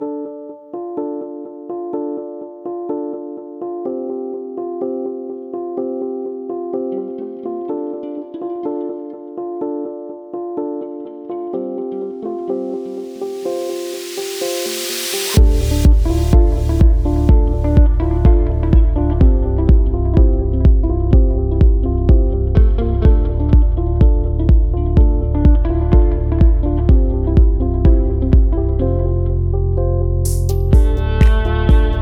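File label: Electric Violinist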